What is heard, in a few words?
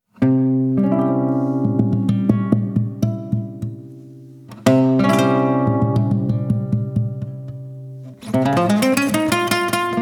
Flamenco